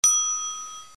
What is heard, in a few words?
desk bell; sheppardsoftware; usa game; government